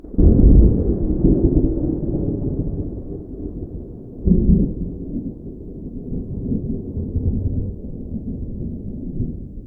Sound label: Weather, Thunder, Tropical, Distant Thunder, Cracking Thunder